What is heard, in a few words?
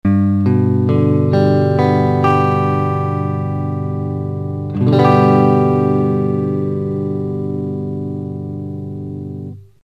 g major, guitar